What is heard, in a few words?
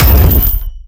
ball and chain hit